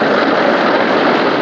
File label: Coaster